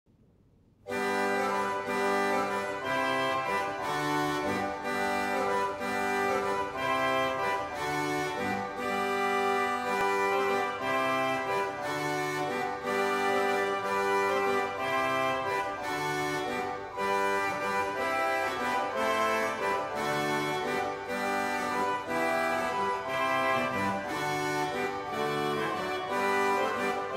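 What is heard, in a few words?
transcription for organ manualiter with pedal; praetorius michael; canarie from terpsichore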